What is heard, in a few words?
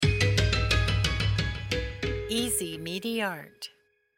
logo old piano